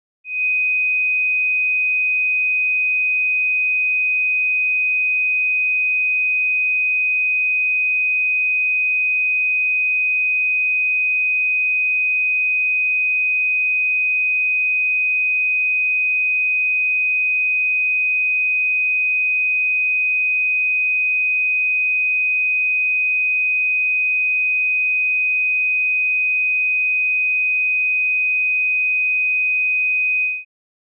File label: 2.5 KHz Minus 15dB; The Sheffield - Coustic - Set-up and Test Disc